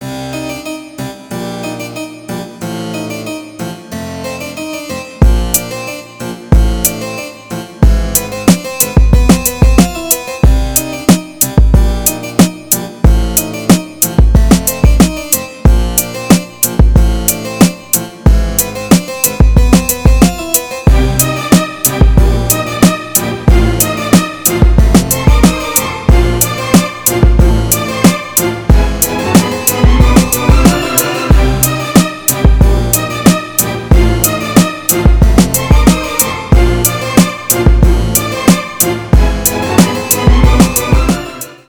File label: Hip-Hop beat; RnB Hip-Hop